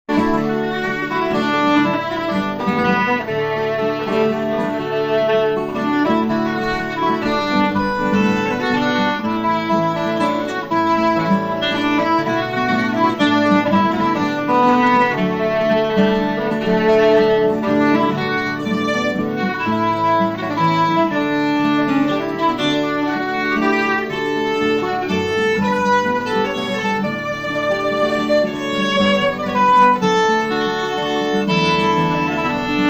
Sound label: waltz